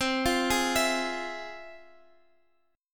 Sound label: F Minor Major 7th, chord